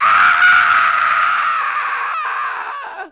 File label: Screams, group Of People